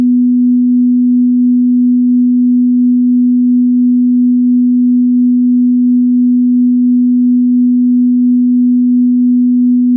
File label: b tone